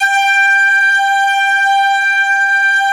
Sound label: ST ORG, STRINGORGAN, Yamaha VP-1